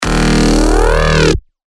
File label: D#, bass shots; Joint; revealed bass shots vol 3